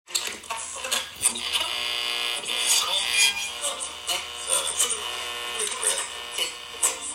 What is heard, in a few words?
radio frequency surf